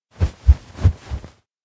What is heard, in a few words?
head shake fast; anemoi